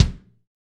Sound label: BD, DR BD; Best Service Gigapack 2